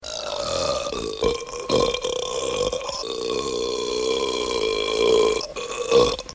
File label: booger burp